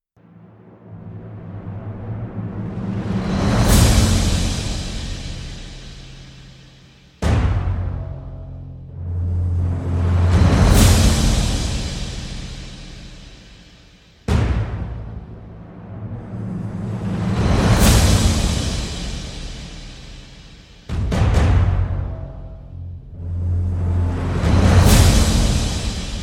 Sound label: perc only